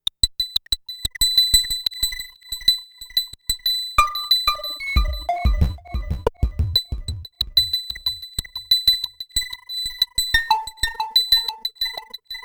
Anamono Xmini one Voice Delay; Gotharman; Pluggy

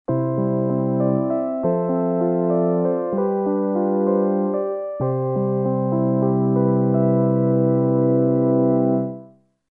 Chill EP

